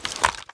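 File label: m203 deselect, grenade launcher; ins2; weapons; handling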